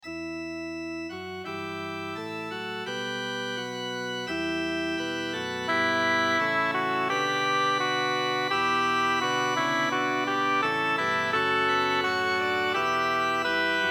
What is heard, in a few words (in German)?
sanctus sopran; victoria tomas luis de; misa ascendens christus